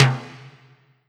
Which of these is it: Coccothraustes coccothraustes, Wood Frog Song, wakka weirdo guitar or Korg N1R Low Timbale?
Korg N1R Low Timbale